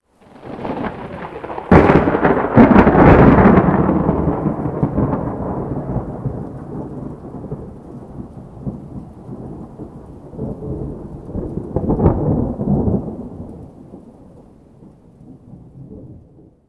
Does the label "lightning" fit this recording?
Yes